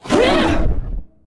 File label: attack, she; combat; creatures; ryuchi; CosmicRage